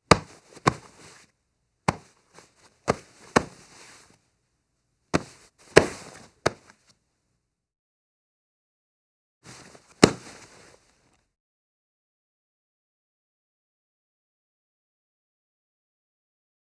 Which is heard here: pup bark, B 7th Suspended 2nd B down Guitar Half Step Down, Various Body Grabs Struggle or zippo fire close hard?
Various Body Grabs Struggle